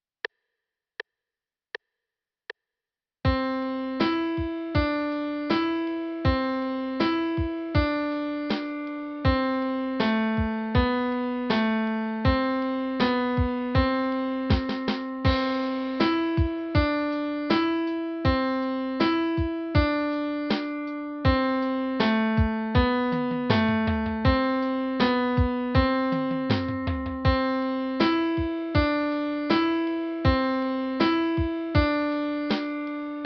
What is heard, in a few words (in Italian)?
Intervallo